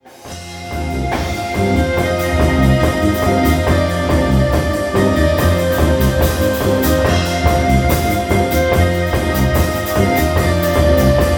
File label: arab